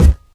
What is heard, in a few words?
physics cube